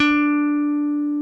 D4 F.BASS; Keith Emerson Signature Series

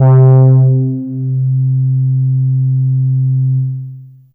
M Brass 2 C3, Fairlight CMI IIe Analog

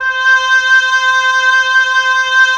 OB, Oboe; Roland - LA Composer vol. 4

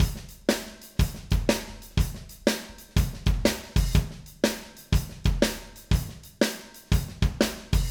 Acoustic Live Drum; 90 s Sample CDs; Platinum Audiolab

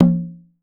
IYA HI D, BATA; Voice Crystal - Steve Reid Definitive Percussion

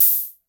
HH Open, Vermona DRM1-MK2 Hi Hat

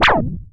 MEMORYMOOG

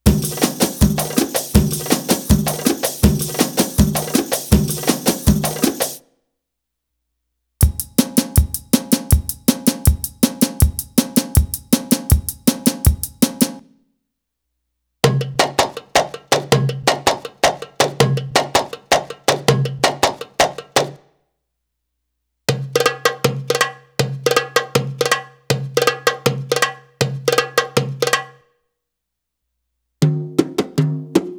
Arabic Rhythms

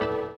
orient, bizarre guitar; Spectrasonics

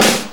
Snare B

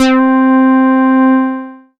synth c, Euro Style; Best Service ProSamples vol.37 - Dance Synths

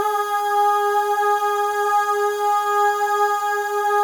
L AHS, Symphony of Voices, FEM; Spectrasonics; FEM.1 AHS

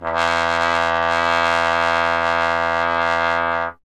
Trombone E; Miroslav Vitous - Solo Instruments VOL-1

